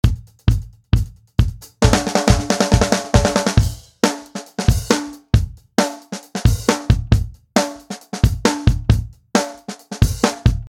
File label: Drums No AVP